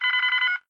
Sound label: alert, computer; Alert 26 (Sensors)